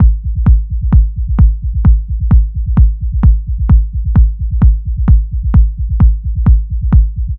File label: 909 Rolling Kick; Drums; Loops; Victek